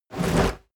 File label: large wood pickup; berufe; foerster